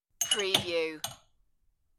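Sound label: Stack Glass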